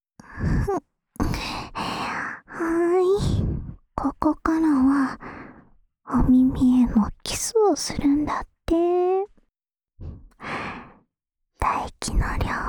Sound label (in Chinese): 稍带唾液的舔耳, ASMR